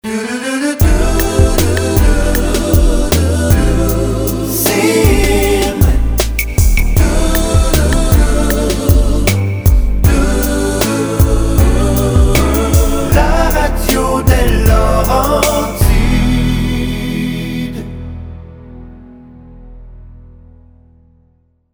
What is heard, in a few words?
SLOW; CIME FM